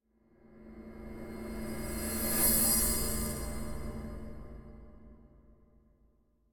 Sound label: Metal Drone Cymbal Reversed Creaking Increasing - Fast And Thin; Hollywood Edge; sound designer's toolkit v2